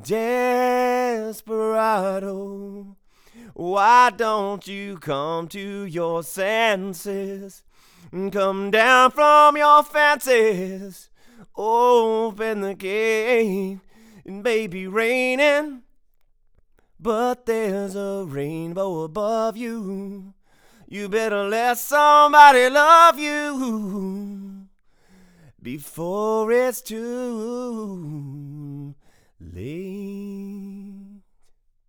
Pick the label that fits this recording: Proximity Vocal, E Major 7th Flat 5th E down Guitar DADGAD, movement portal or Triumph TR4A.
Proximity Vocal